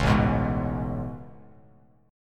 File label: A Major 9th A, Bass Standard